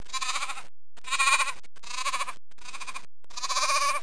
agneau, animaux nature

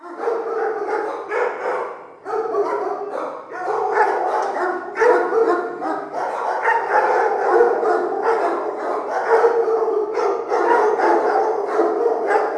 chien, animaux nature

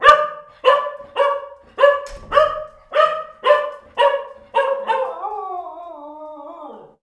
chien

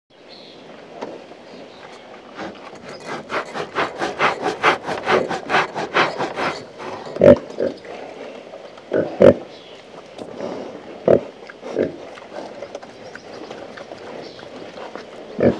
cochon

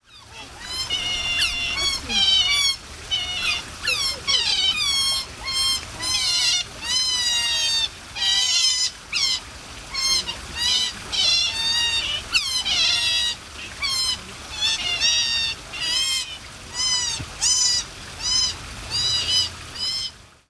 Loutre

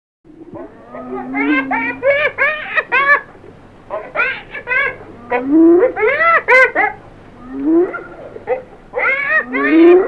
hyene

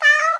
chat

